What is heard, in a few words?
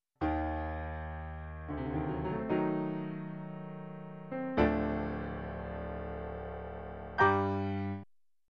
Dark Elegant Piano Line